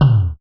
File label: TOM, House Side Of 2 Step; USB Soundscan vol.51; AKAI; TOMS